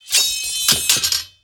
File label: Glaive Medium Underlay Death